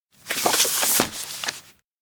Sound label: shelf return sketchbook; anemoi